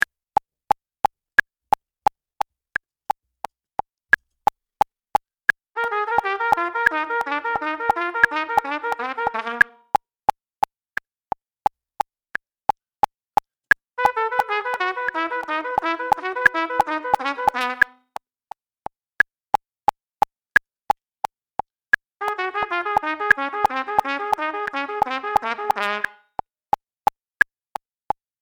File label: Double Tonguing Exercise Level 4